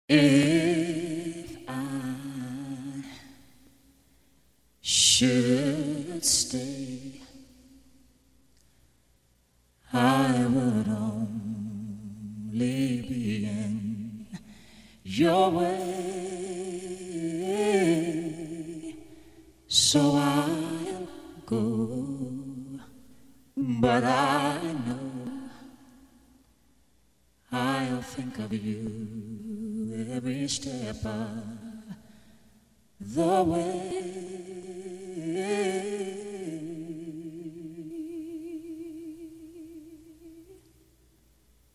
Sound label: Duet